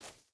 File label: footstep burlap gravel jump start; Rust